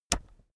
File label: keyboard external space push heavy